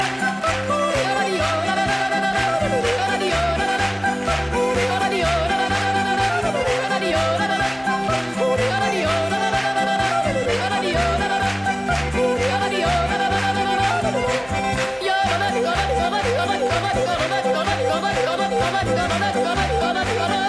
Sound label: Jodel